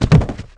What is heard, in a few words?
Baddie Drop, Falls